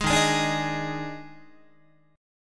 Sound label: Eb Major 7th Suspended 4th Eb, Guitar; EbM7sus4; strummed